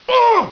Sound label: death; cstrike; codlife